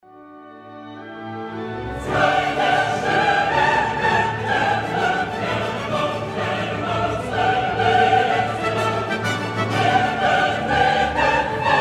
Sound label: Choir